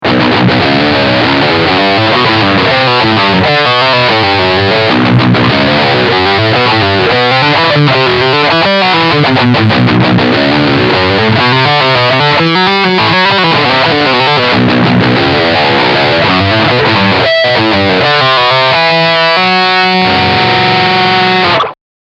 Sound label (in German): Leadline Steg Humbucker